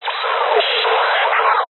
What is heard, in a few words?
science fiction transmit error burst; zapsplat